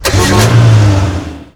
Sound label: MAP MOVE CAR; maimai